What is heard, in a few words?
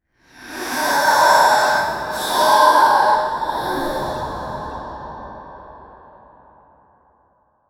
big fart sound followed; sound effect